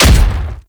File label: Thumper Bot Step Heavy; Warframe; Grineer; Movement; Steps